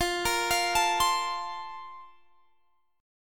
Bb Major 7th Suspended 2nd

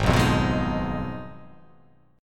G sharp Minor 6th; 4,2,3,4,5,3,5,x

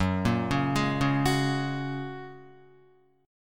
Gb Major Add 9th, down, Guitar; arpeggiated; JGuitar

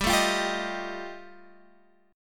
G 7th Suspended 2nd Sharp 5th G chord

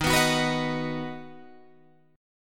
Eb Minor Sharp 5th Guitar Open G

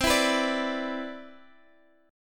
C Major 9th C down Guitar Drop D

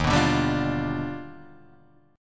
Eb Augmented Major 9th Eb down Guitar Drop D

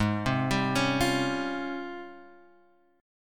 Ab Major 13th, AbM13 chord; arpeggiated; JGuitar

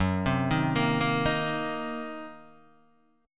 Fsharp 6th Flat 5th down Guitar DADGAD

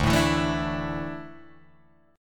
D Suspended 2nd D down Guitar Drop D

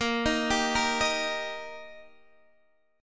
D sharp Major, A sharp

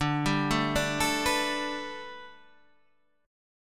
D 6th Suspended 4th D, chord; D6sus4 arpeggiated

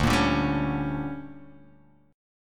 D Major 7th Suspended 4th Sharp 5th, chord

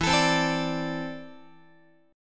E 7th Suspended 4th Sharp 5th, Guitar; E7sus4#5; strummed; x,12,12,10,13,13

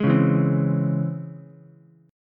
C sharp Minor Major 7th C sharp Ukulele; C#mM7 strummed; JGuitar

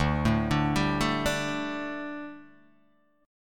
D Suspended 4th D, Guitar, Open D

